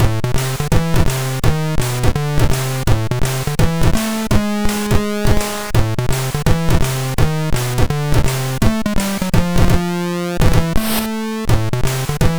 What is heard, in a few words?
sid MOS6581R2